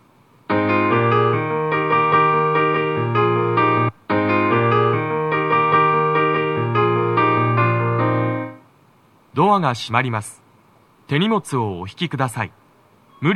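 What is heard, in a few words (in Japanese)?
発車サイン音, ピアノ; 2番線 綾瀬・北綾瀬・取手方面 発車サイン音; tokyometro chiyodaline nogizaka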